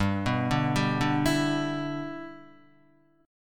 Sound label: G 6th, Guitar; G6 arpeggiated